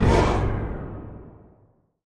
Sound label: combo Cooldown; pyramid